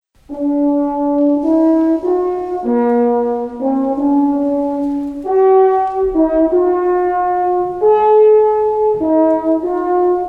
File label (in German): waldhorn